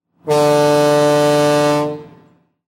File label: sound boat